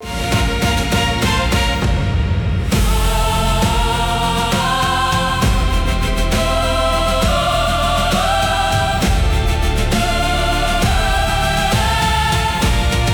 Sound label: loop